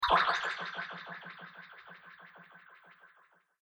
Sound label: Arcade Game Magic Vibe Slime; Video Game Sound Effects; Retro Inspired Sound Effects - Think Nintendo, Atari, C64, Etc.